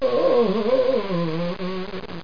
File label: groan